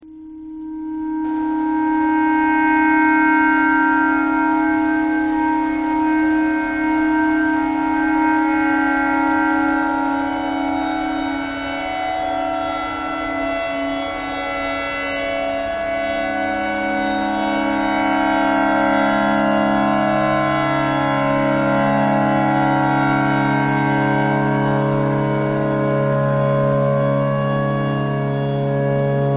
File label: tornado siren clarinet thread pentatonic